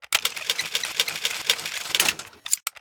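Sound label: steampunk movement landing; smite; serqet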